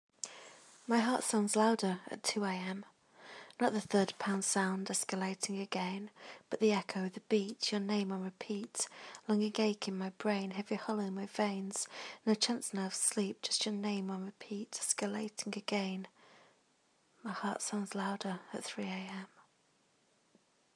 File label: my heart sounds louder at 2am